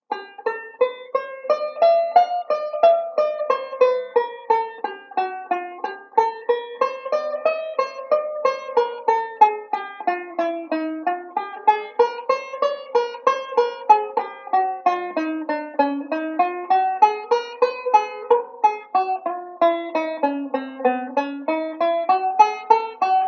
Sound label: slow, chord melody; Beyond Chord Melody Volume II